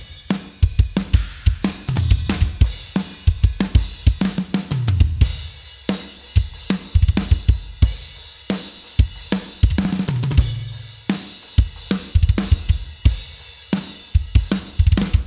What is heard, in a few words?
mixed